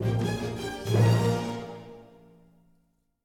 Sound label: Music Menu Theme End; asterix obelix slap them all 2